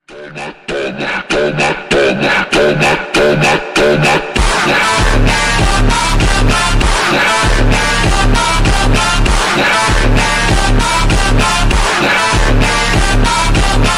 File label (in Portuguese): ultra slowed reverb